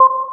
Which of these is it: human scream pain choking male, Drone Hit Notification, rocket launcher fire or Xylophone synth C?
Xylophone synth C